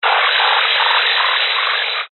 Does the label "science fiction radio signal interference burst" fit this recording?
Yes